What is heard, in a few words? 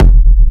Industrial Techno Kick oneshot F, Nicely shaped, crispy and reverbed industrial techno kick; hard techno, peak time techno